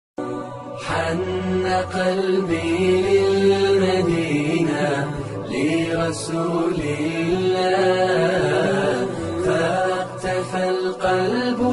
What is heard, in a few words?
Beautiful Arabic naat islamic ringtone